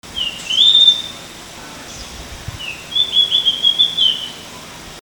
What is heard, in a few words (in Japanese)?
isohiyodori